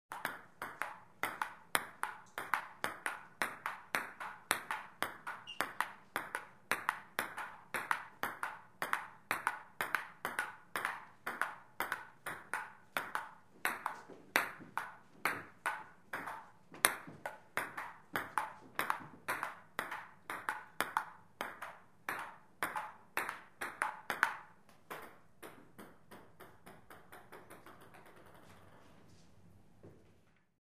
igra v ping pong

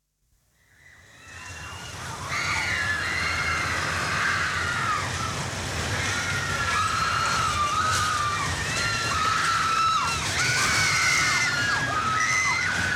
zvuki - Kriki s attrakciona

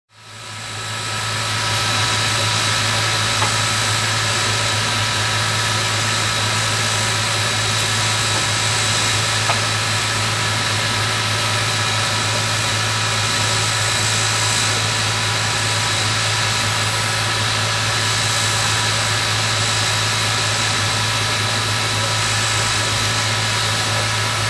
parovoz na platforme

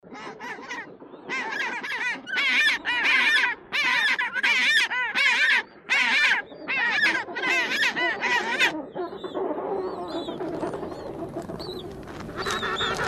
zvuki stai pingvinov

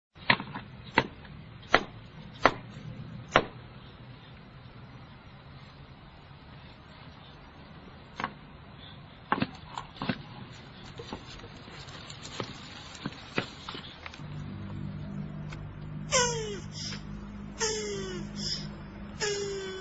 zvuki piska cherepahi

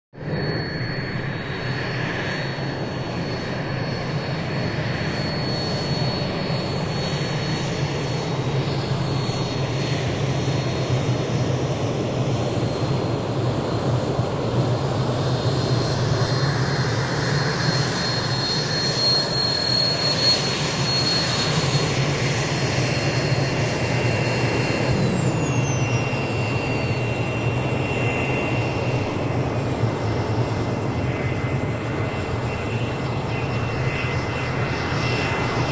turbina reaktivnogo samoleta

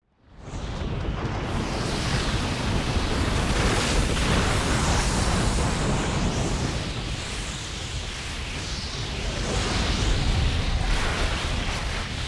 gornaja lavina

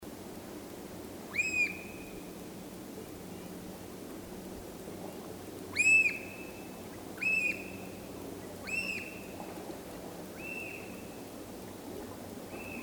chernyj kulik

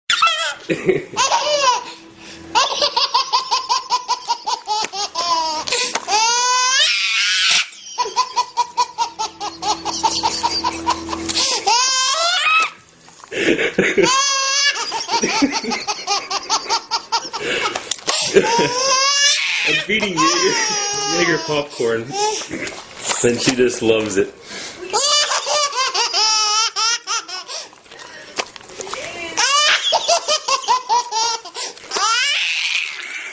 smeh rebenka i vzroslogo

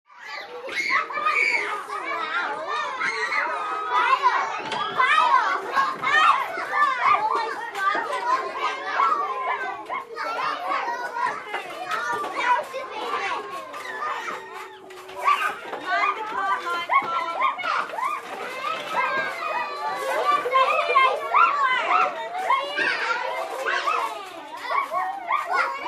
zvuk detskogo sada

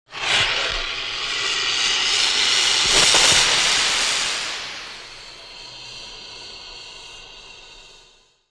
shipenie monstra

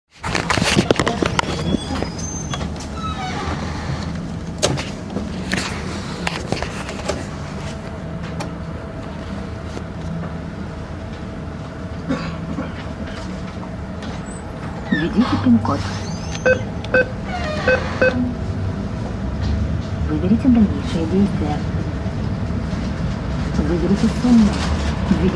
zvuk snjatija deneg s bankomata

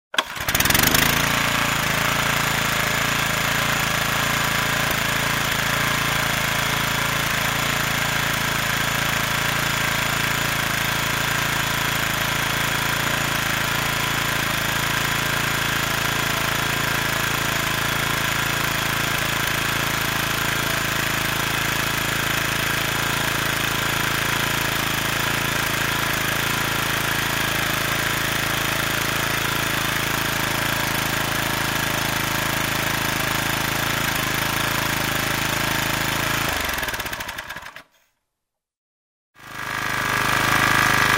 gidravlicheskij nasos

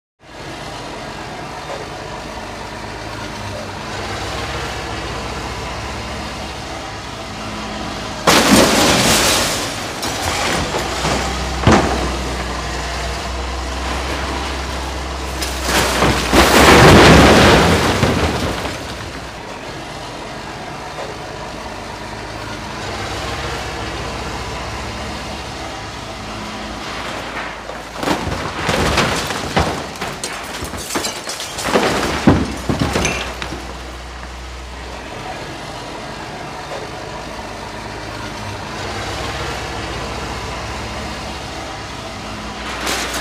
avtomobilnyj kran

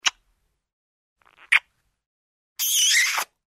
narastajuschij chmok

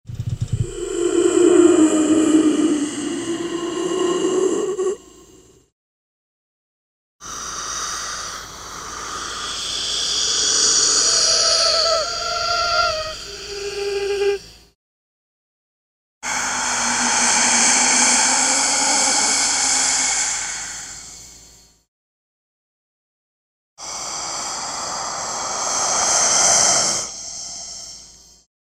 dyhanie prividenija

